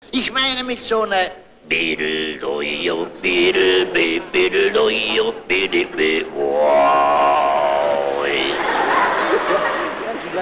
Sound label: bass